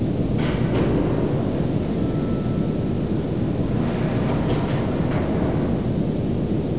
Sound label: hangar; svencoop